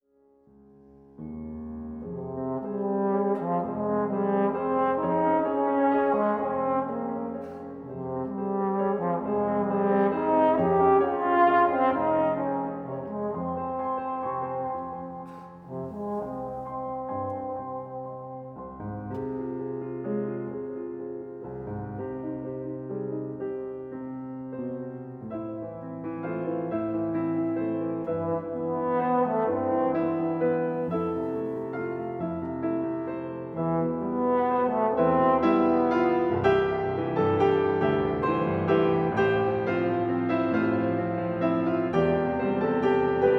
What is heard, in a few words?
Concertpiece for Trombone, trombone and piano